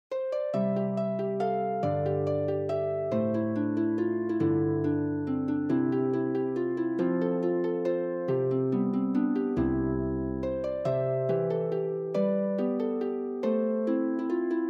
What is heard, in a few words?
Mazurka